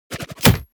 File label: weapons knife throwing hit